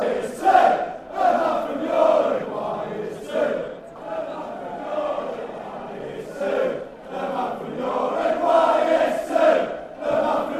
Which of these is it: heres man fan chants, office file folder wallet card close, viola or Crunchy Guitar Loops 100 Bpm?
heres man fan chants